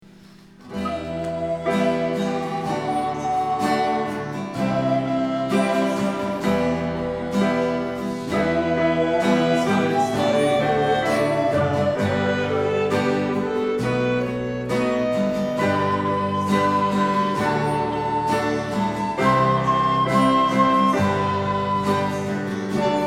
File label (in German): Band Aufnahme